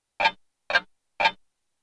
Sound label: clock; microtf2